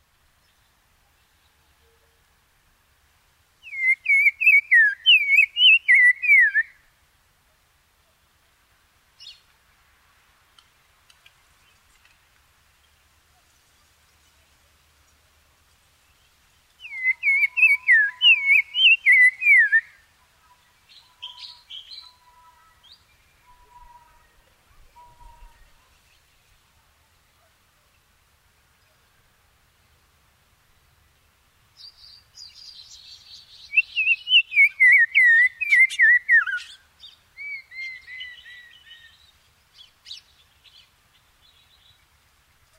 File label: black crowned tchagra